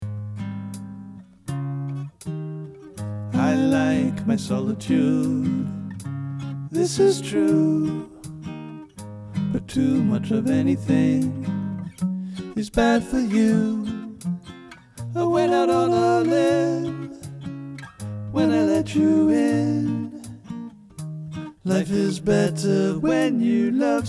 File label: Introvert Song Last Verse Harmony Up